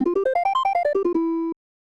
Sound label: Surprise